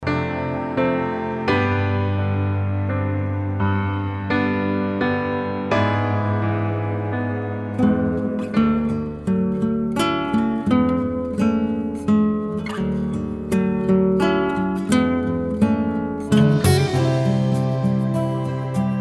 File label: Acoustic Instrumental